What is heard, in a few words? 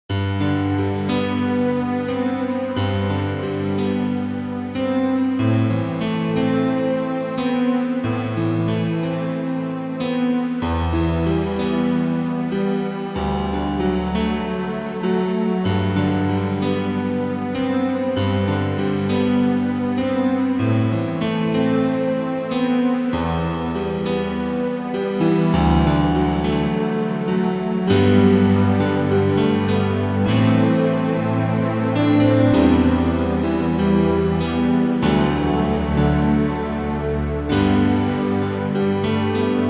synthesizer